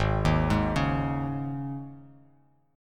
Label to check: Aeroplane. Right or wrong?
Wrong